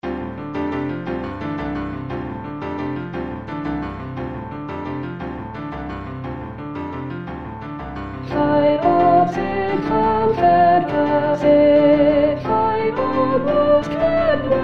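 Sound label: Fie on Soprano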